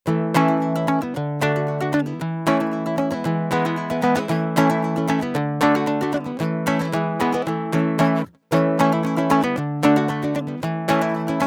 baritone ukulele country pickup sequence; loops

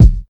sharp steel kick drum sample a sharp key

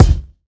bass drum sample c key

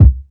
natural bass drum single shot c key

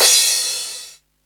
huge crash cymbal one shot c key

